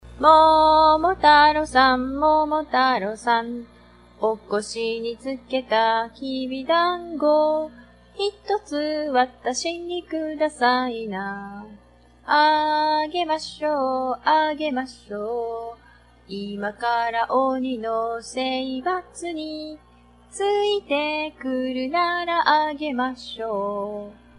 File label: Momotaro Song; Japanese Reader Collection 02 - Momotaro